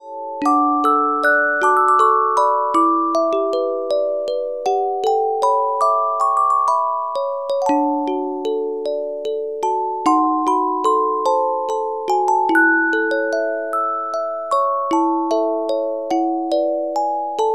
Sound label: Carillon Music Box Melodies